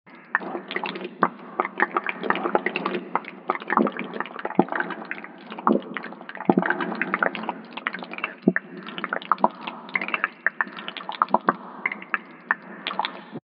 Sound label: Simulated Underground Lava Stream